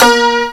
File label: PWANG; fairlight cmi